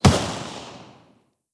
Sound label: Fire Works; blue archive